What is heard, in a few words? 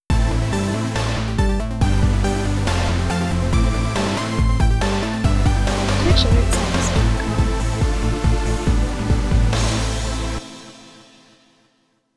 Synthwave Raid